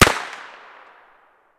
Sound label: grach fire outdoor far; Escape from Tarkov